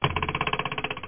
Eject

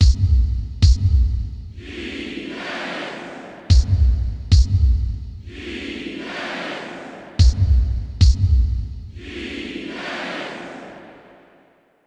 ditty

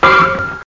Klunk Bell

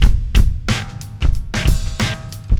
Hip Hop FX, Drums; ACID MUSIC

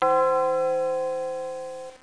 Church Bell; Castles - Siege and Conquest; Castles Demo

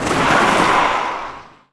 G Skid; SuperBike2001

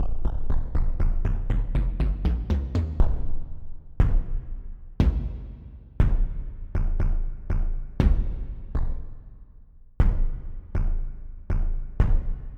Solo Tom Dry

